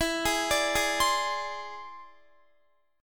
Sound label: E Augmented 7th E down Guitar; E+7 arpeggiated; JGuitar